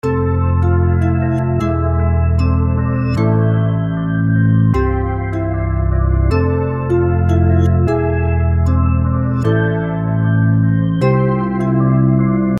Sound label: 153 bpm C